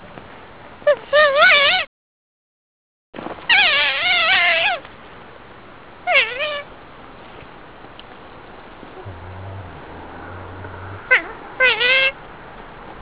otter warning

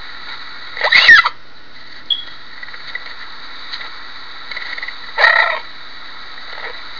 cougar cry

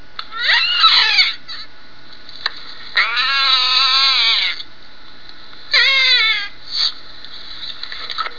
otter

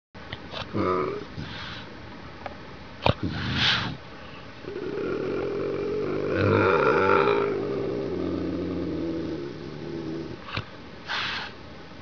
bobcat growl

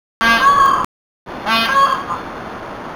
peacock sound

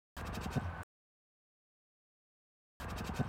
Snow Leopard Chuf

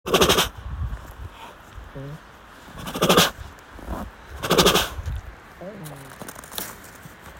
Tiger Chuf

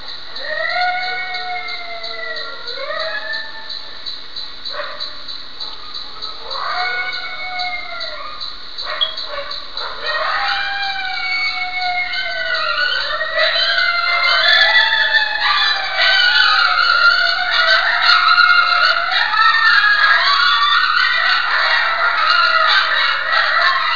wolves

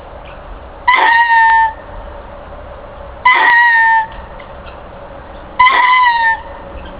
Lemur Call short

